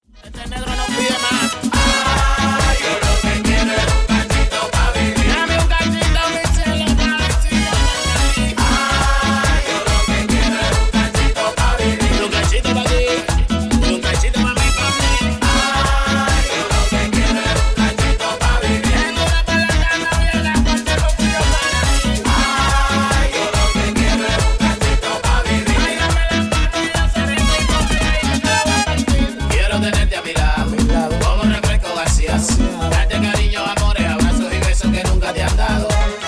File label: latin explosion; multitrax